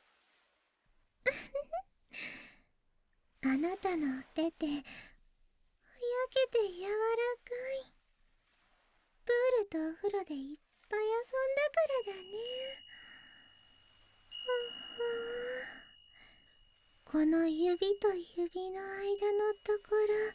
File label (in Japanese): 触手耳かき; 海月